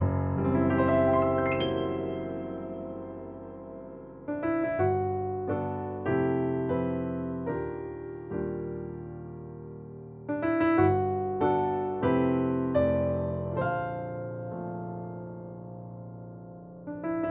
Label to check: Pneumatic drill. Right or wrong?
Wrong